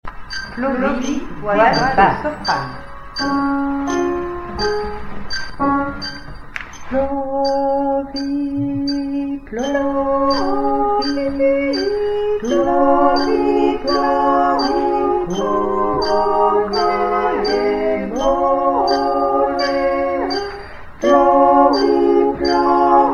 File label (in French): Tutti